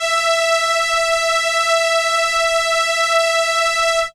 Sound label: Violin E5, ARP Solina, synth; musicradar-synth-samples